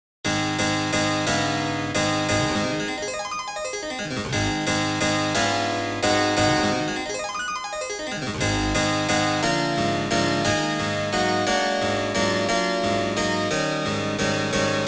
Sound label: Waltz Of Flowers Harpsi 31edo; Nutcraker